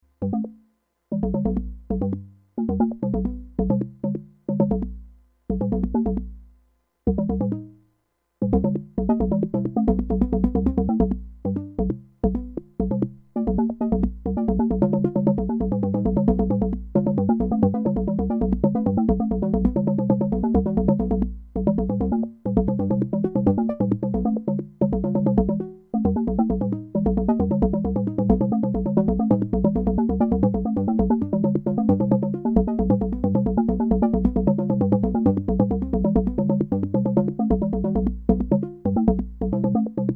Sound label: FM & Vactrols